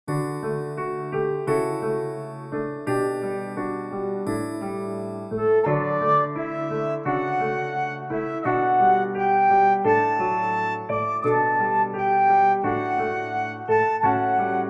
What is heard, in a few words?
backing track